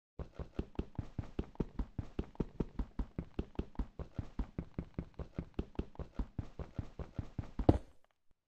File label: breaking stone minecraft sound effect